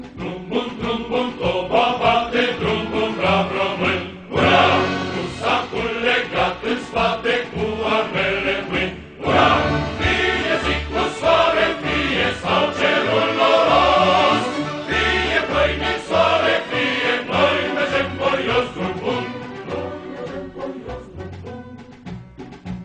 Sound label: Drum